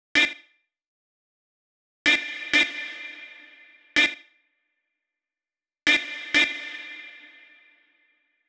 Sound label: 126BPM Cutted; Vengeance Samples; Inception Kit